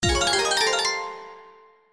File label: SFX Symbol Wild Win; Wealth Gods2